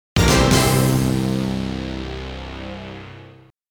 Stage Clear; savage reign anthology arrange sound trax gamerip; Rest, Repose

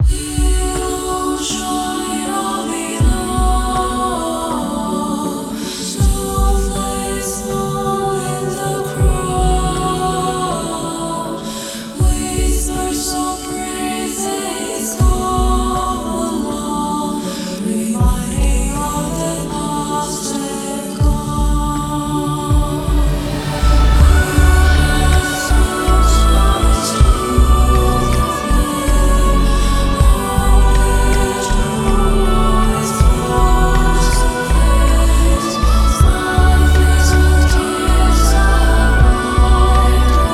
Canon in D Choirs Version; kidou sentai iron saga original soundtrack vol.2

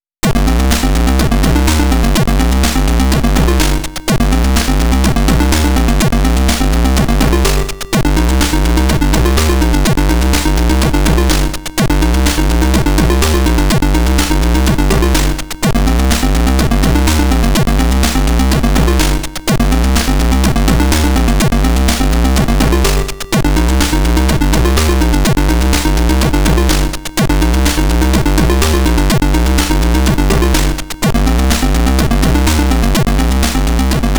Stage BGM Atari ST; Street Fighter II; U.S. Gold collection

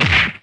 punch short

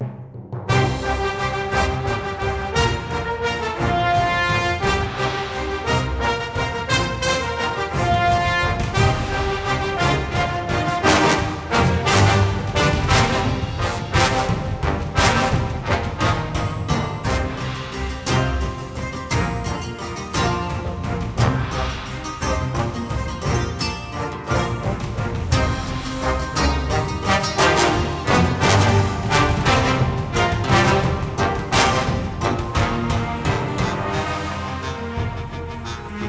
battle music; portal quest; android

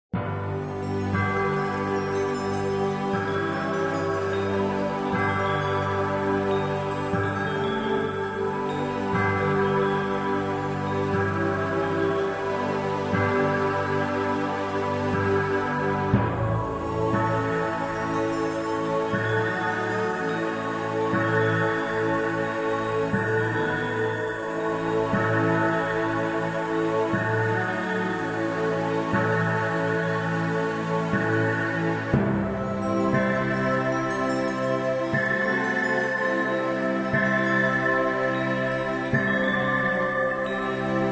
Federation Ambient

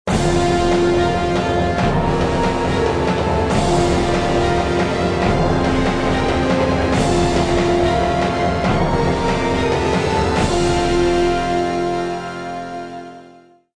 Medieval Combat; Civilization Revolution

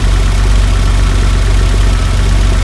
Civic 2020 Idle

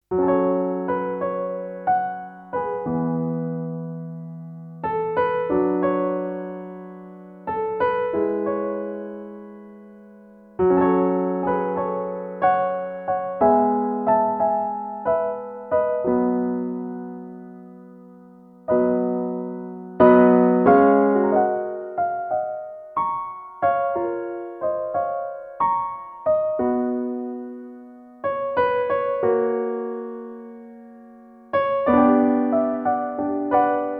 Ararat piano version; Lightbox2 008 music collection

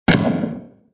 Rock Asteroid Collision; Angry Birds Space

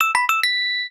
Jingle Fanfare; Pokemon Smile

